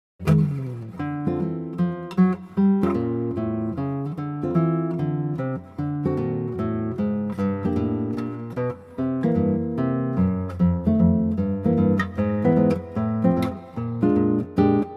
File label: Study Walking